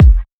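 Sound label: Kick, F minor; Sample Banks; Percussion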